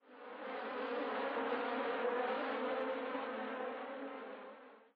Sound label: bees, summer; ambient soundscapes; dota